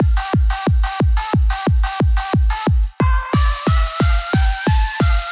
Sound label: Happy HardCore